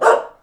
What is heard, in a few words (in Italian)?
cani dog; animali